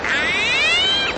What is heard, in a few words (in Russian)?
krik kasatki